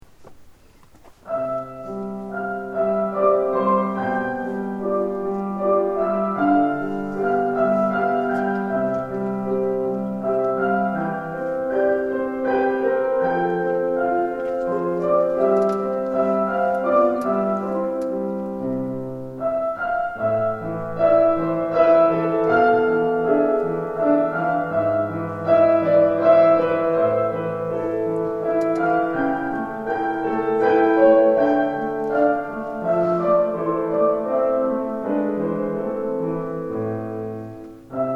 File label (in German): Menuett